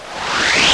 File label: teleport out